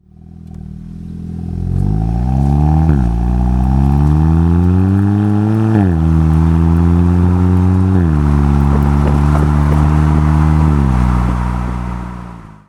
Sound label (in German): sportage langsam beschleunigen 0-70; bastuck